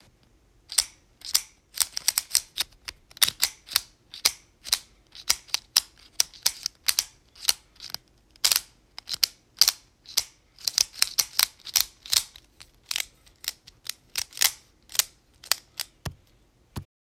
Hexagonal Pen

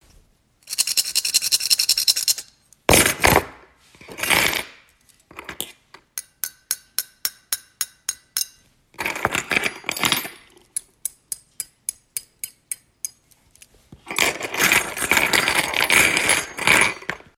small forks

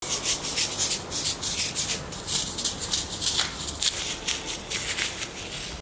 Silica Gel Packets

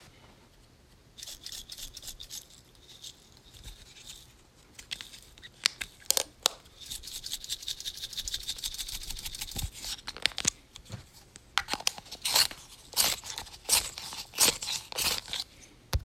Pencil Sharpener

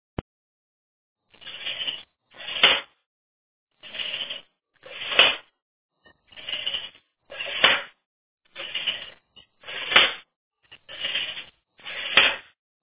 Tape measure

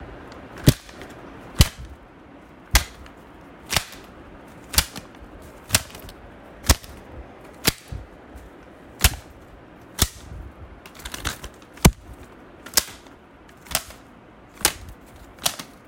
Paper slapping

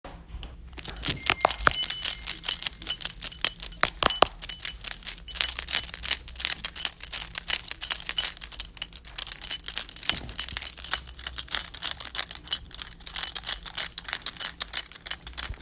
Keys sound

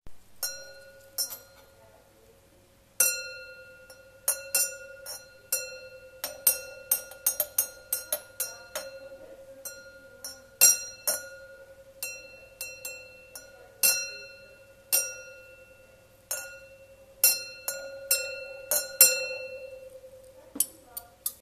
Wine Glasses

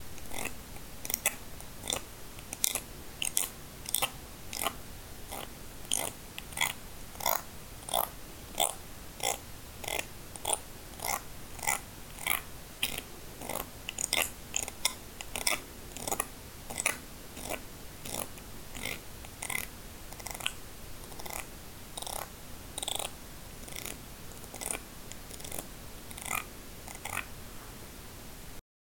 Afro Pick

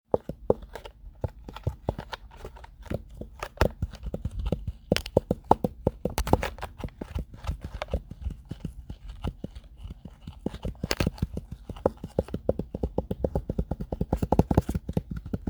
Empty Honey Bottle

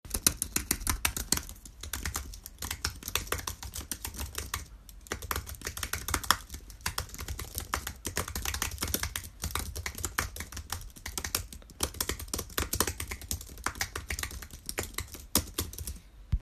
Keyboard

